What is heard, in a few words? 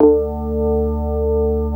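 CX3 organ; USB Soundscan vol.09 - Keyboards Old School; AKAI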